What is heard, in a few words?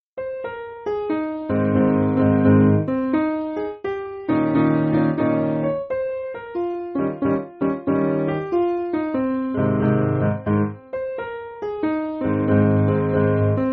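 Piano, Backing Files for Churches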